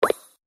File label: reel stop; Candy Bona